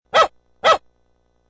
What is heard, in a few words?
Dog Barking, Dog barking sound effect of two barks.; Dogs; Animals